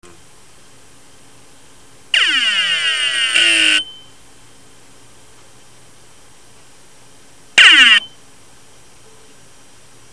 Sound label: head stuck to platter (phaser noise)